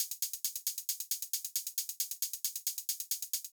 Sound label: Beat - Hats, Grime, 135bpm; Zero-G Sound Sense Grime Scene; Construction Kits; A Positive Vibe